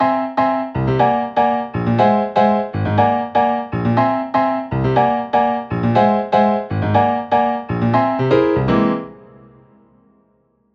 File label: Jazz Symmetrical Scales clusters; How To Improvise With Symmetrical Scales